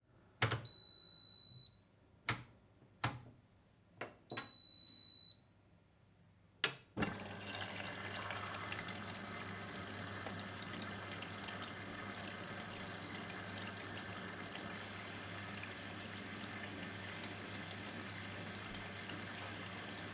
Washing Machine; HauntedBloodlines; LaundryCinematic; SFX Producer

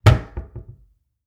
Cupboard Door Close; SFX Producer

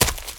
STEPS Leaves, Run, High Quality Footsteps

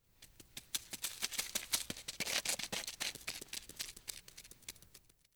Rat Footsteps; MinorHallutinationsSounds; SFX Producer

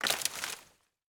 Footsteps Branches; HauntedBloodlines; SFX Producer

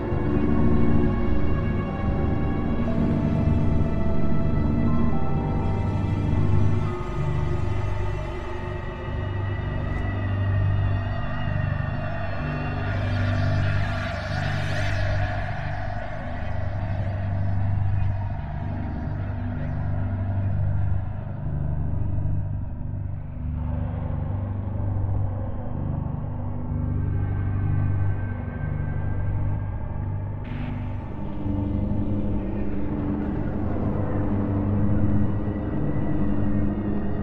Creeping STEMS INSTRUMENTS LOOP

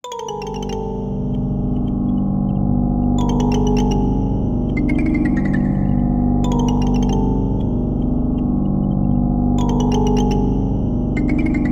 Broken Toy Weird Bell; HauntedBloodlines; HORROR MUSIC BOX

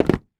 Footsteps Wood; HauntedBloodlines; SFX Producer

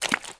STEPS Swamp, Walk; High Quality Footsteps

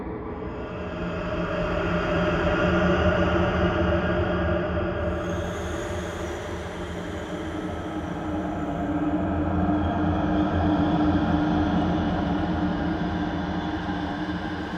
Horror Atmospheres Music; HauntedBloodlines

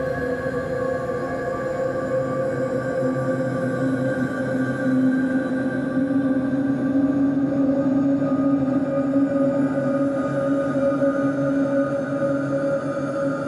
Horror Atmospheres Music, Loop; HauntedBloodlines

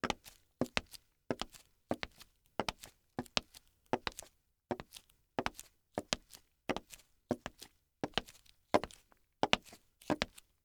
High Heels Walk; Footsteps; HauntedBloodlines

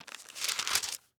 Bible Turn Page; pages; HauntedBloodlines; SFX Producer

